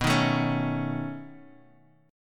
Db 7th Suspended 2nd Sharp 5th B

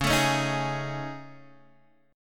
A Major 7th Flat 5th

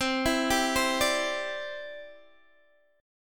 C-Major Add 9th, Guitar DADGAD

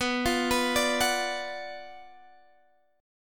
Gb Major 7th Suspended 4th Sharp 5th

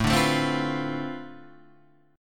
D Minor 13th A

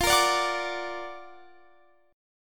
Gb Augmented Major 7th F

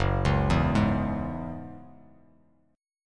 F sharp 7th Suspended 2nd F sharp down; F#7sus2 arpeggiated

